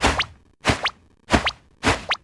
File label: distraction; crab